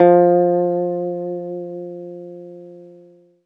ZITHER; Best Service; Alpine Volksmusik

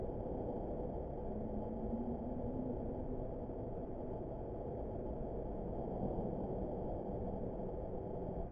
Misc Noise; layering

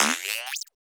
BOING; Distored Reality

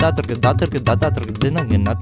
INDIA L, IND ENS PH, Heart of Asia; Spectrasonics - Heart of Asia CD2

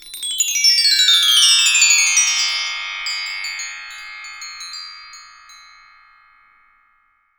BRASS M-L, TREE BELLS; Voice Crystal - Steve Reid Definitive Percussion

